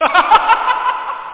game over; Space Captain

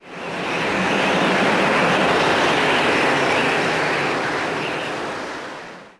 wind; DAoC

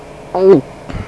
swallow; People; Sound Library 2000; Wayzata Technology

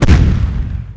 Shot Hit Wall; Defiance

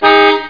car horn

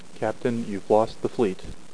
lose

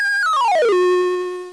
alarm

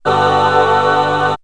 Voices Bridge, Chorus & Voices; KABOOM! - Wild and Wacky Sound Software; Music

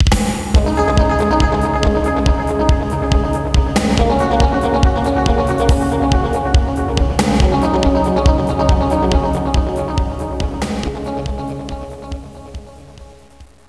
Outro; DR. BRAIN; PPentode

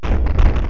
battle

